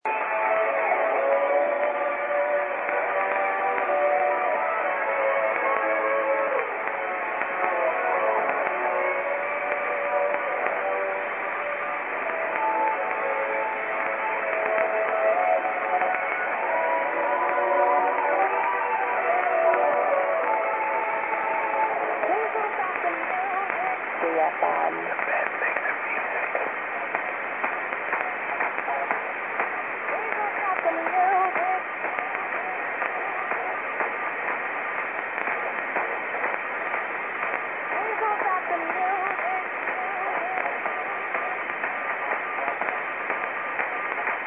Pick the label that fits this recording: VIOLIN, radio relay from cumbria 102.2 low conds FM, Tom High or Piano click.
radio relay from cumbria 102.2 low conds FM